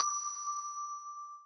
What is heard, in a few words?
bell